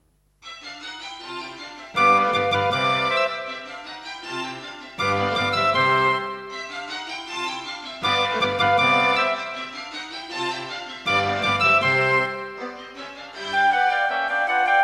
Mozart - Concerto K467 partial, Creative Sound Blaster AWE64 Gold